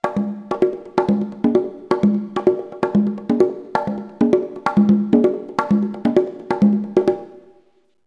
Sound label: Conga